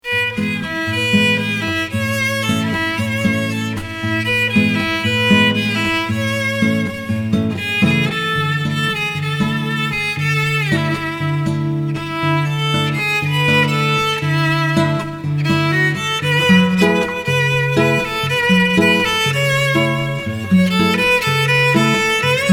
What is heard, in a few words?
-, violin